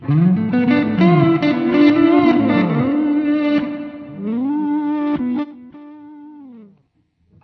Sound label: reverse echo, Guitar Noizes